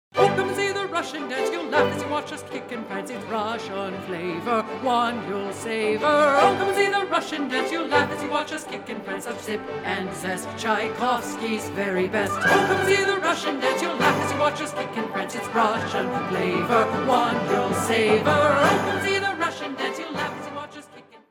vocal; RussianDance

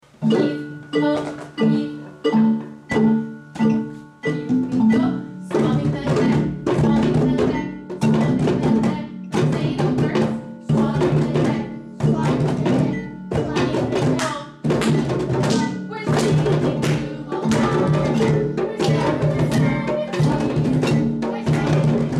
Song

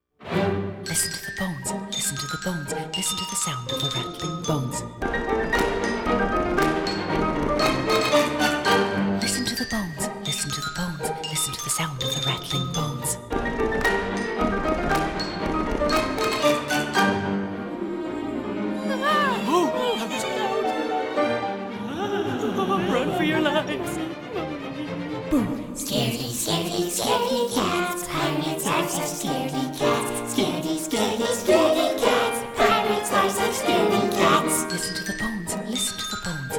Fossils Vocal

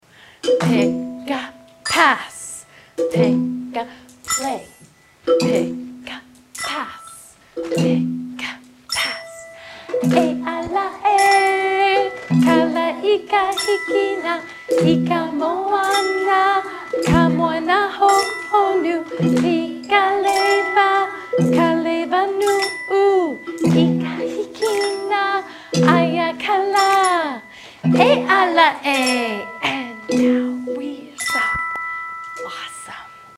E Ala E with Singing and ORFF Instruments

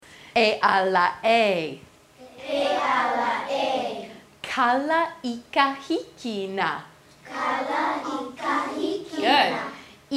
Lyrics Only

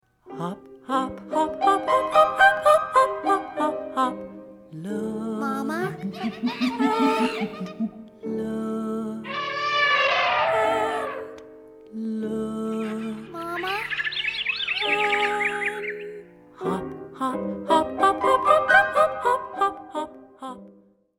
Kangaroo vocal

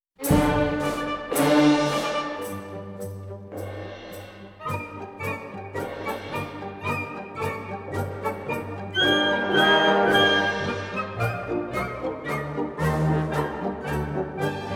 Persian March Inst

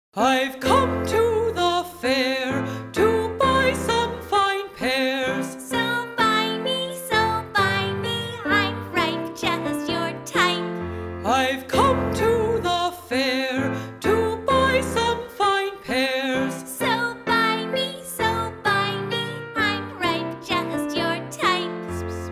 Minuetto Vocal

